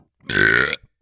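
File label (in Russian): громкая отрыжка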